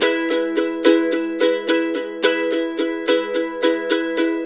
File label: RIFF, Blues Guitar Samples; ILIO - Fretworks